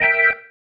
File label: Stab One Shots, Organ